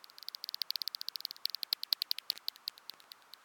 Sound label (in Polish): Nocek rudy